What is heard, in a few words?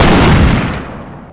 hunting gun shoot; tf2classic